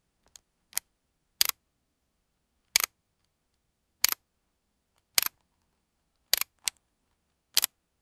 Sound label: camera shutter clicks; equipment